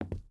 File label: footstep boots wood walk; Rust